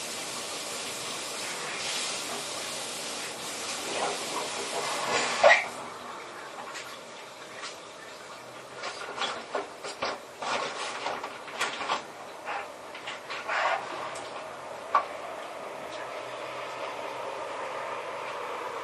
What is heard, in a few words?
bath siren police